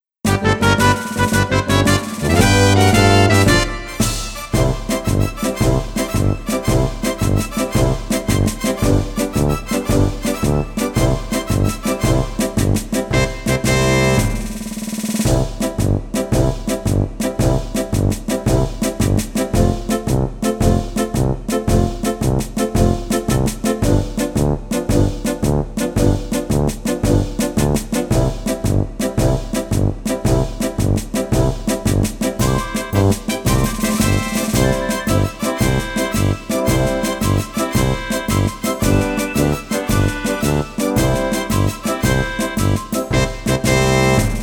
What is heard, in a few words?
karaoke